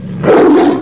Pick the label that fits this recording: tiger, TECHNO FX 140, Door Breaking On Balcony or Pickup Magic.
tiger